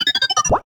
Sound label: Toaster